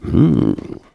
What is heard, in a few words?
Hmpf; Neighbours From Hell Console Edition; GC, Xbox